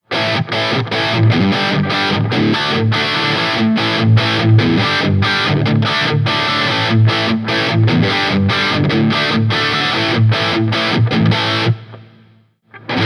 foundary dirty, humbuckers; foundry; pickups

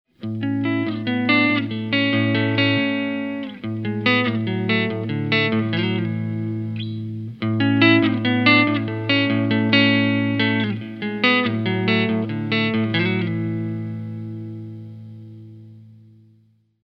classic clean split, humbuckers; phillips classic; pickups; phillipspickups

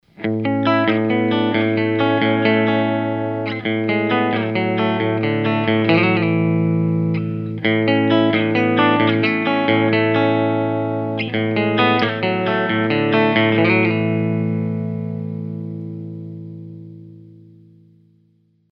clean split; bountyhunter; humbuckers; pickups

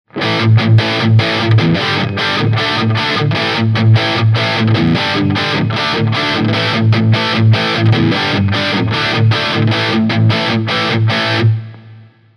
dirty, humbuckers; bounty hunter c; pickups

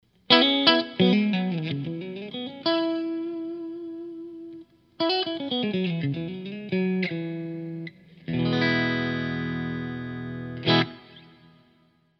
bridge middle, strats, pickups; dirtroad

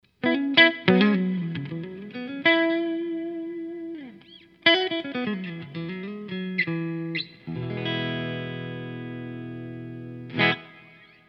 dirtroad middle, strats; pickups

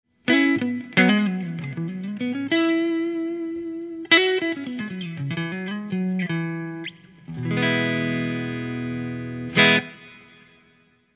delta neck, strats; pickups

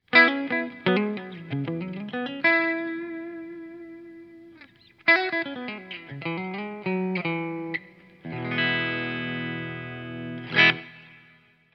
bridge, strats, pickups

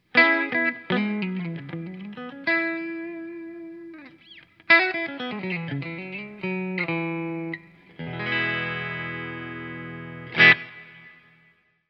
bridge, strats, pickups; dirtroad

